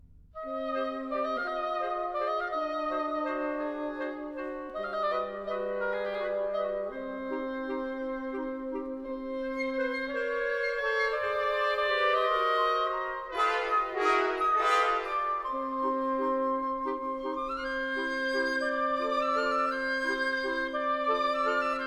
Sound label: organ